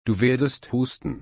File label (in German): husten